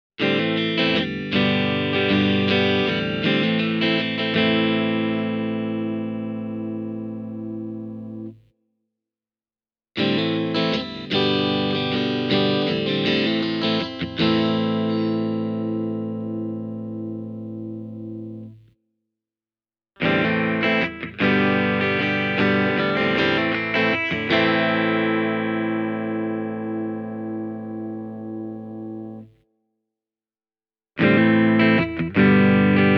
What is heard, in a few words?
esp ltd mm 30 clean